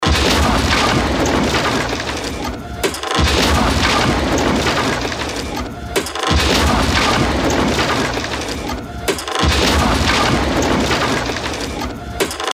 danger fx loop

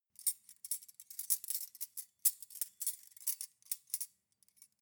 cutlery cake forks handle long

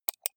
computer mouse right click

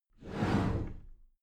cupboard slide door slide heavy

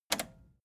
record player repeat click fast

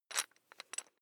electric drill bit fasten wrench turn very fast

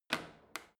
washing machine creaky button press in

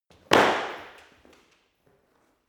impact hard indoors

